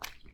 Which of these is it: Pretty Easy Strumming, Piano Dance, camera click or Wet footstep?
Wet footstep